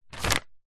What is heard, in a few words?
paper sheet a4 grab pick up; foley